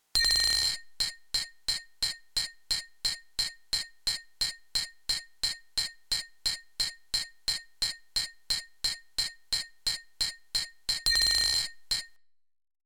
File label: Glasses Clank Cut Up